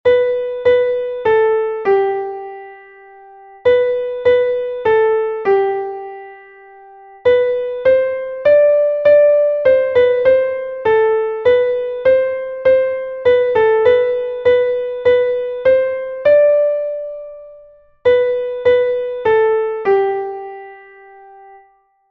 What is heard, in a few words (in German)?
klavier; MelodieMeister